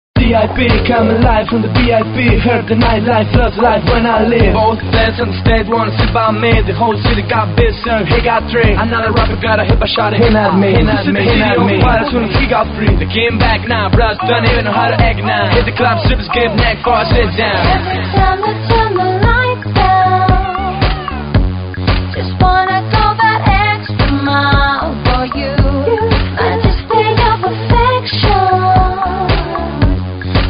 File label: funk ultra hiphop